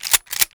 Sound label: Shotgun Pump Action; Foley; DoomRTX